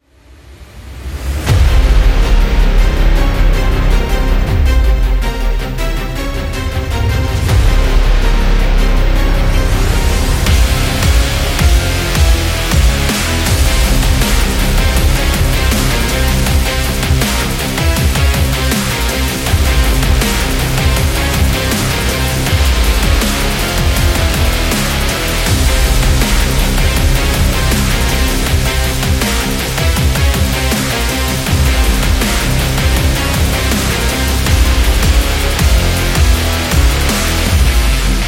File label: Agressive Epic Dubstep; epic sport metal